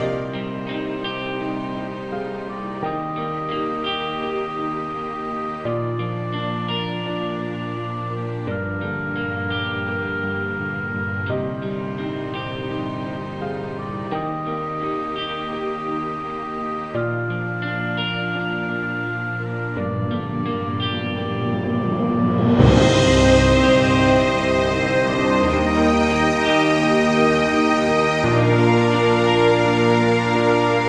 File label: dramatic guitar; by burak sound bank; dramatic dram vol 12